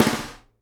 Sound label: snare GLASS, Live Snares